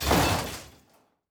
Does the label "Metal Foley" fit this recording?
Yes